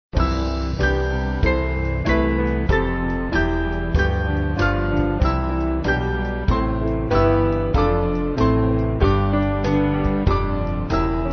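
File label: Band, Music Backing; Holy Spirit Come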